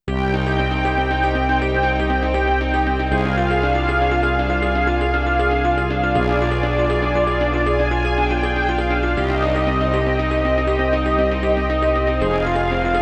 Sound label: ROOM, SC-88; Crystal Rinal; PC-9801